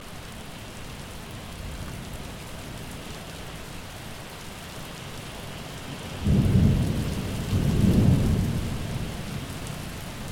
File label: complete summer storm with thunder